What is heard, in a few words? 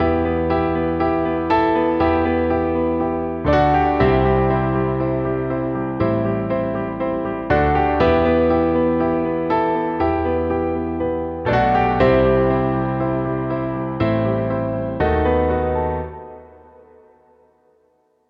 120 BPM Broken Main Piano Em; Leads; Ghosthack